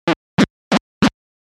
Beep And Bleep Sequence; electronic sound effect; beeps, bleeps, blips

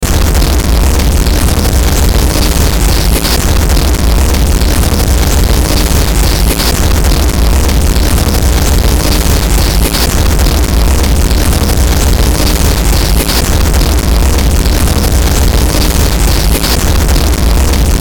Bed Harsh Distortion Crackle; Bed Sound Effects

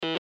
Morse Code E Letter; Blastwave

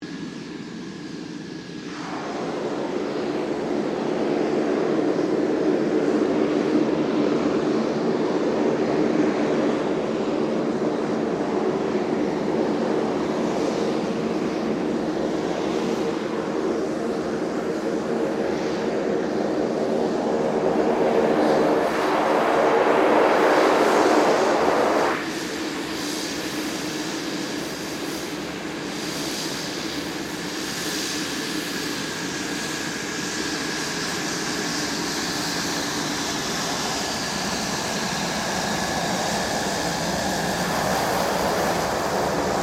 2007 KING AIR 350 TAXI; Airplanes; Vehicles

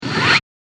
Fast Material Whoosh; Whoosh Sound Effects

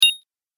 Click Sound

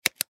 Button Switch

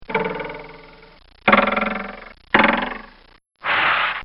Comical Sound Series Boings Arrow; Funny Sounds

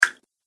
Water Button, button sound effects; multimedia

